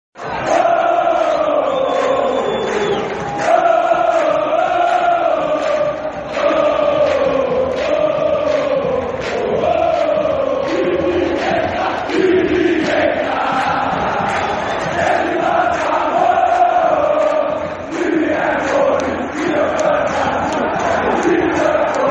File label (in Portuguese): torcida; rio branco atletico clube